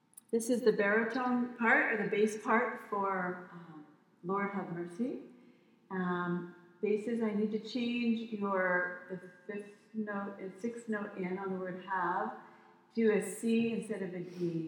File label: Lord Have Mercy Baritone